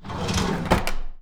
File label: DRAWER, household; AKAI Anime Sound FX